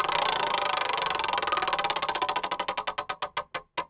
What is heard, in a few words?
spinning, lucky wheel